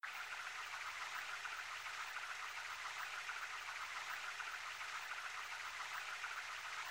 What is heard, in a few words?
Spin, slots; Genies Wild